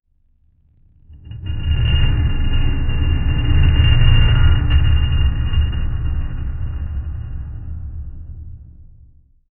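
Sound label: heavy metallic chain rattle, chain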